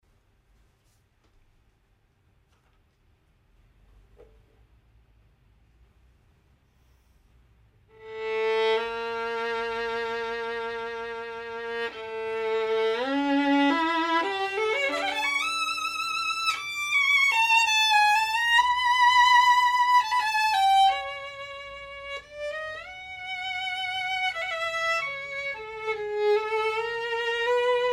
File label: Classic, Professional Violin